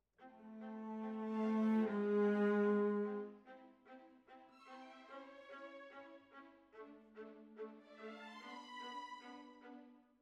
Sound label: Cellos